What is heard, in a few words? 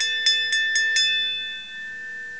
message; chesstal